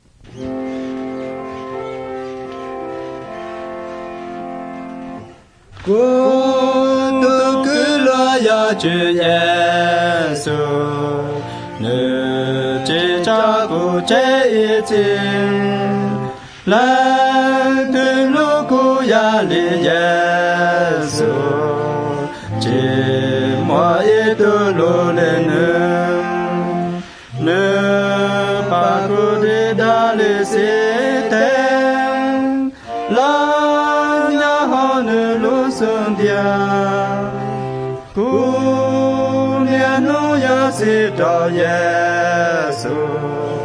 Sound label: songs hmong white